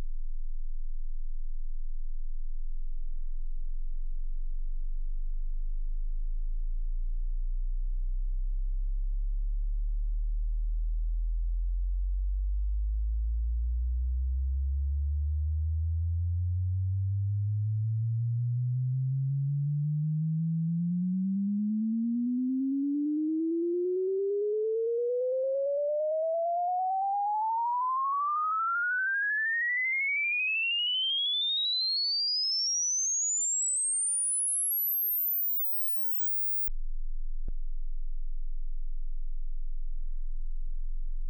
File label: Frequency Response; Rohde & Schwarz; UPA; Rohde & Schwarz UPA-CD